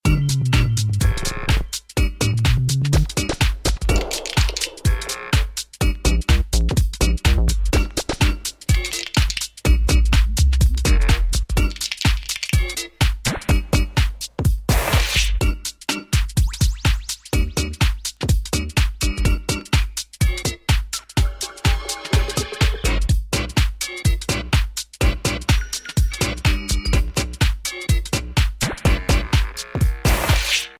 drone; flOw

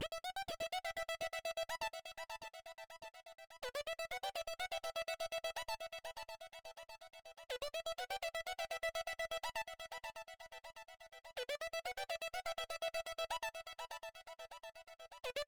melody synth

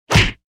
punch face hit; fights bodyfalls; Asian Show Sound Effects

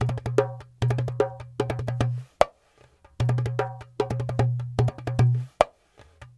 Dumbek

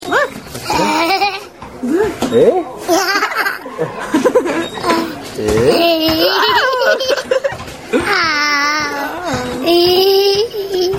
kid laugh

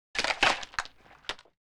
cracks medium piece; fights bodyfalls; Asian Show Sound Effects

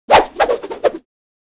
swish multiple; crashes swishes impacts; Asian Show Sound Effects

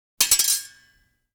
sword hits double; fights bodyfalls; Asian Show Sound Effects

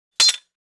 sword hit dead sing; fights bodyfalls; Asian Show Sound Effects

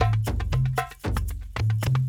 Kendong

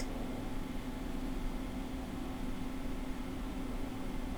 hum

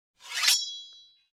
sword shing; fights bodyfalls; Asian Show Sound Effects

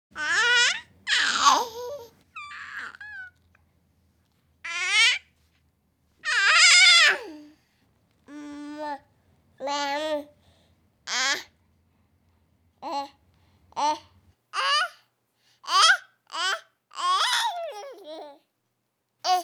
baby vocals playful; Asian Show Sound Effects

